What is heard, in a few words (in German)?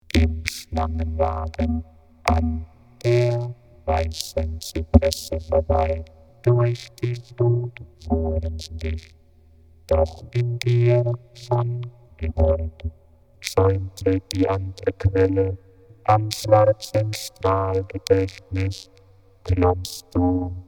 Orgel